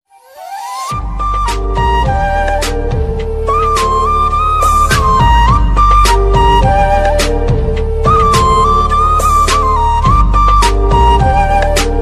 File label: flute mix ringtone instrumental ringtone bgm flute ringtone